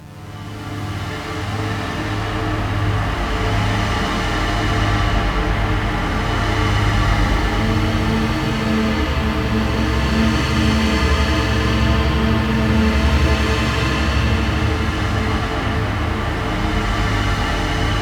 additiv 2 Layer reverb delay; synthmaster

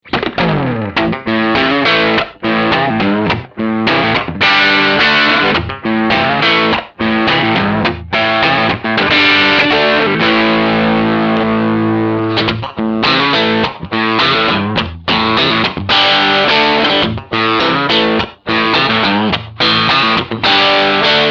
Coil Split Crunch 3 Positions

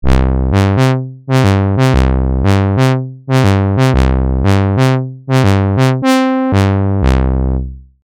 Repro Trumpet

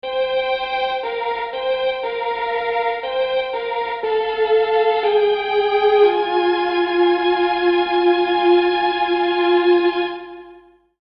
Strings; Crimson

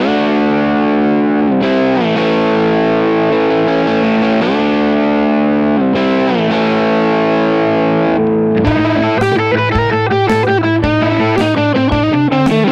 Godin Session Strat Bridge Position

